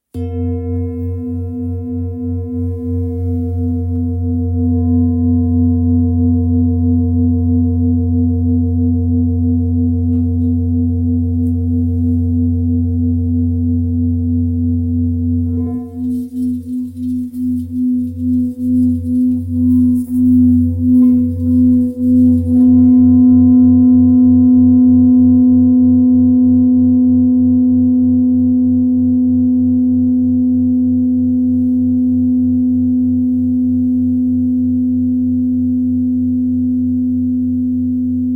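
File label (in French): Bol ramgandi si, bols chantants tibétains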